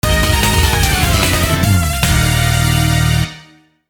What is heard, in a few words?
Mission Failed; Fate/Unlimited Codes